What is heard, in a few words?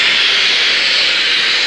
Jetpack Extinguish Loop; Rabbids Big Bang; Android iOS Windows gamerip 2013